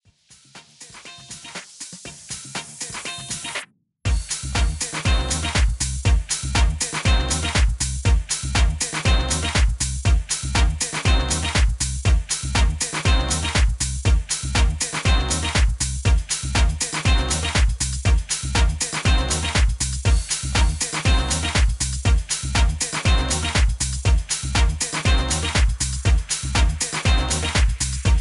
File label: FUNK SAX